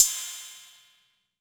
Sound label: Ride, Rides; Electronisounds